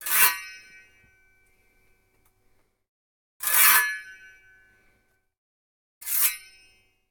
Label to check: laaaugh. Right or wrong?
Wrong